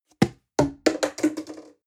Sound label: plastic can small fall bounce hard; objekte; dose